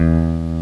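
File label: Guitar (jazz) E2; X-Wing; X-Wing Patches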